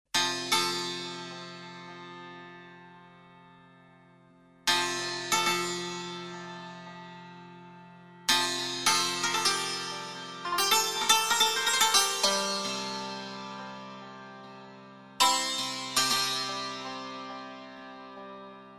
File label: Taknavazi Santour Mahour (Mahour Santour Solo)